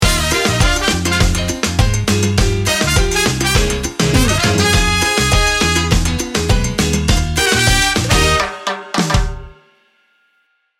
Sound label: salsa fire sting bumper